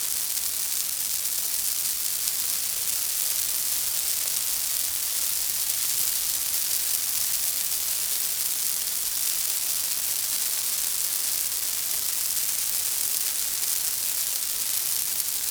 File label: Lantern Area Light Sizzle Loop; bramble the mountain king; ps4 ps5 switch windows xbox one xbox series xs